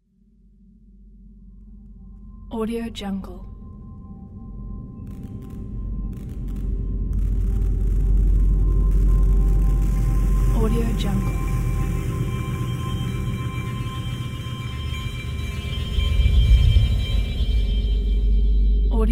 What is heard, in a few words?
satellite